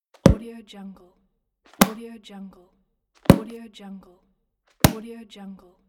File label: closing book